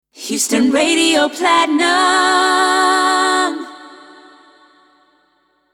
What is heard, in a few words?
Radio Jingle D Key 142bpm Acapella; Houston Radio Platinum